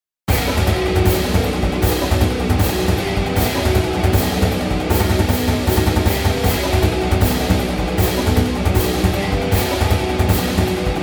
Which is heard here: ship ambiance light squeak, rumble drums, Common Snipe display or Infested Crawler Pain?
rumble drums